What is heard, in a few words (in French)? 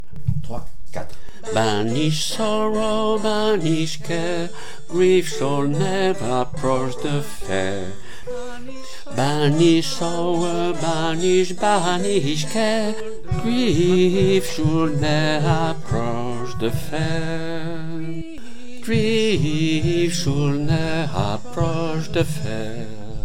HOMMES, chorale; Banish Sorrow